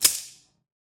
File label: gun loading; soundscrate